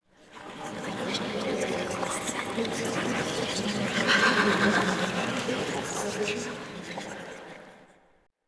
City Crowd; Ambient; Sid Meier's Civilization 4 Complete; Beyond the Sword

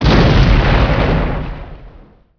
explosion large; Emperor - Battle for Dune

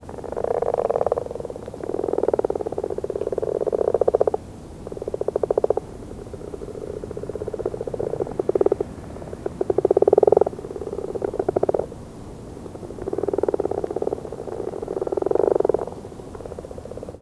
pond frogs loop, swamps; ambient; Left 4 Dead 2

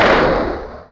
Bazooka; BaboViolent 2

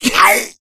smoker pain short, voice; Left 4 Dead 2

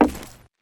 wood, footsteps; Counter-Strike Global Offensive

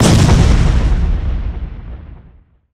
explo medium; explode; Left 4 Dead 2; boomer

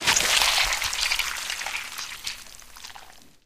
blood spurt, splat; Left 4 Dead 2

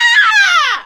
witch pain; npc; voice; Left 4 Dead 2

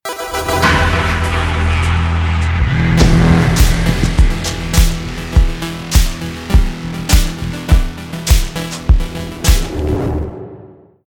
round mvp anthem, music; Counter-Strike Global Offensive

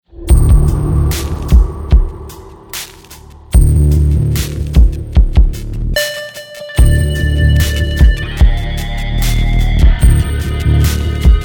choose team, music; Counter-Strike Global Offensive

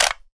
sniper military clip out

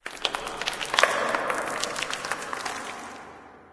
debris random, ambient

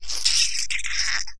carrier drop; Alawar; Star Defender 4

